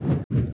speed step; Movement

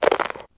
USE EAT

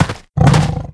run; Monster; 레이드; Mob raid; 백호왕

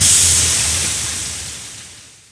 steam

